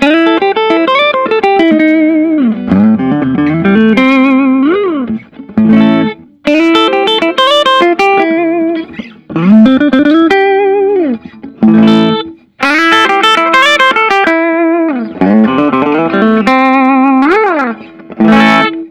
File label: collings city limits deluxe acid wash